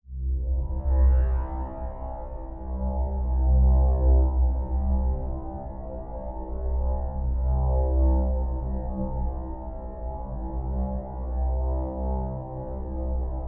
Drone Bed Pulsing Windy Sub Bass; Production Element Imaging; AdobeCC

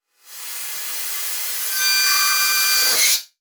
Transition Reverse Whoosh Reverse; Production Element

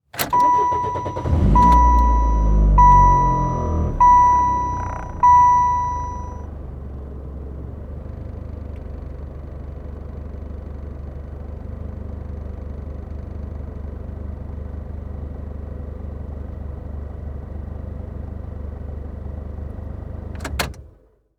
Auto Saturn Start Idle Shut Off Interior; Transportation

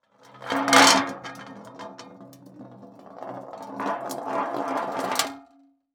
Transportation Hubcap Roll And Land Sudden Stop

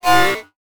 Science Fiction Sci-Fi Electronic Chime

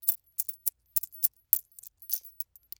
Foley Coin Jingle In Hand

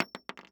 Weapon Bullet Shell 44 Shells Fall On Wood Floor; AdobeCC

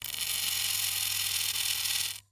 Industry Tool Motor Servo Scraping Metal

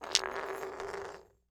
Impact Glass Bottle Being Dragged

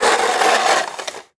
Weather Snow Shoveling Snow On Driveway Long; AdobeCC

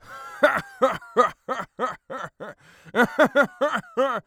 Human Male Pirate Laugh Short

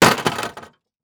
Impact Metal Bang

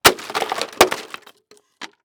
Impact Wood Crash Multiple

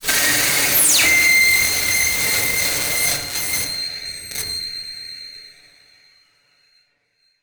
Title Transition Communication Distortion Jammer; Production Element Title Transition

